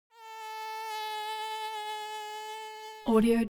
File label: insect mosquito buzz; animal; smartsoundfx